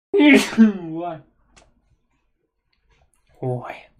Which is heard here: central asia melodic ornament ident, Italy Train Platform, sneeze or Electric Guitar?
sneeze